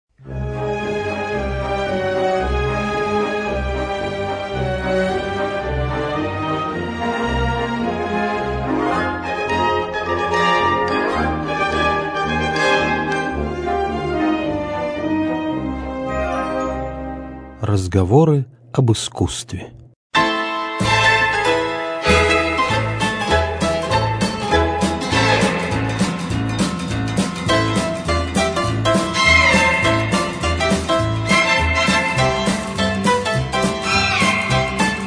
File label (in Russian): hor duhovenstva; tkaterinburgskoj eparhii; razgovor ob iskusstve